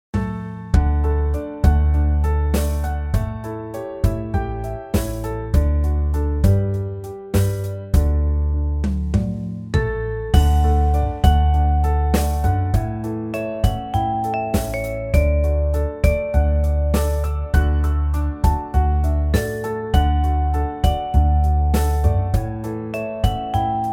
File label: karaoke; Malaika